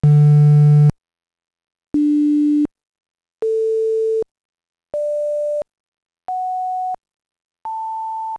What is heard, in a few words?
six harmonics